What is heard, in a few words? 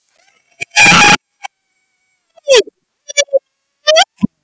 screams